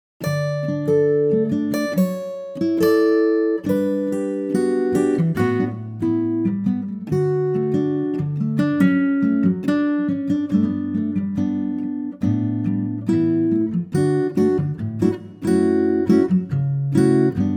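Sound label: Ac Guitar D, male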